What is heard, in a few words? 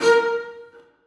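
celli staccato a4; Sonatina Symphonic Orchestra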